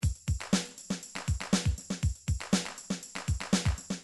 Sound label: drums rap, midi drums